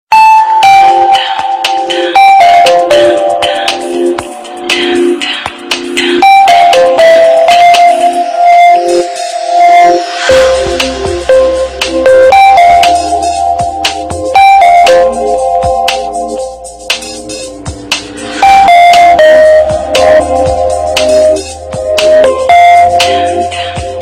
samsung tune ringtone

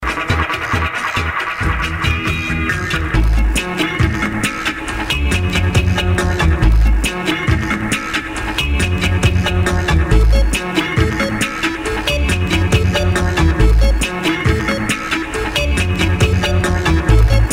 electronic

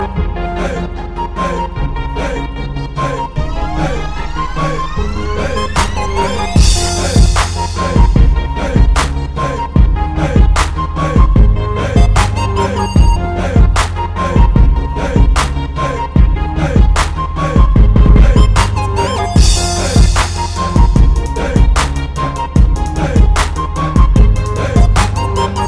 new year party tune

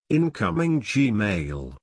incoming m; gmail

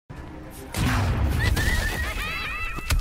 laugh; harley quinn

